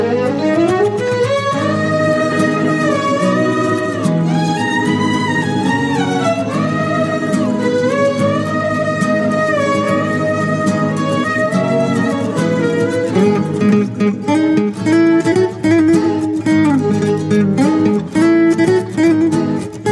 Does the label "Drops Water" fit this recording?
No